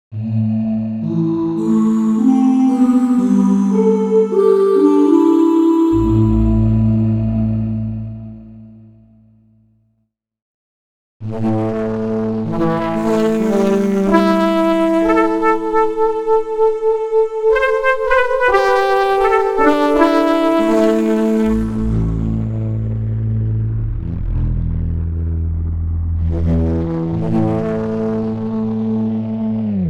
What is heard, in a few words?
yamaha ex5r sounds fdsp selffm choir raw and selffm